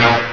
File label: GUN; Death from Above